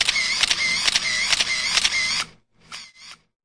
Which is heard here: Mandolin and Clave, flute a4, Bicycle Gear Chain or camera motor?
camera motor